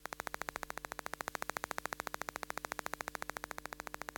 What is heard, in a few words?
Sci-Fi Machine EMF Toolkit Machine Idle; ElectroMagnetic Field Library; Spectravelers; Quantum